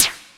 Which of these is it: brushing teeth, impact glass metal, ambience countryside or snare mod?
snare mod